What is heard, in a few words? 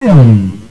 fall; phantom